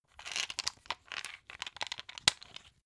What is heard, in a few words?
pepper mill assemble long; berufe; gastronomie; soundpool